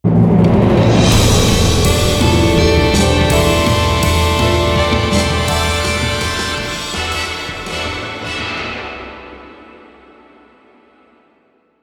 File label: map intro; netent